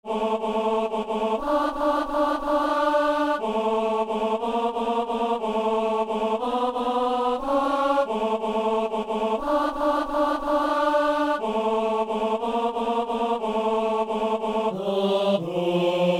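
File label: tenor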